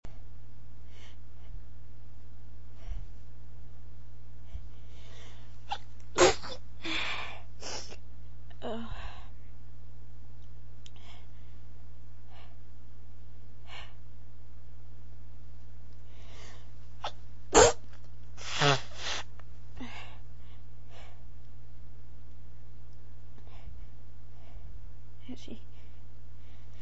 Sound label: stifles, female